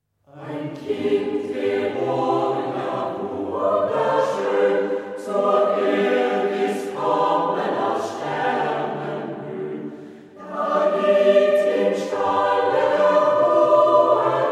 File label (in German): chor; Ein Kind geboren gar wunderschön; Volkslied; Chor Haag